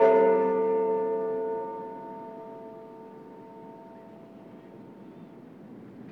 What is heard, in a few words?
Bell loop; 1944 Battle Of The Bulge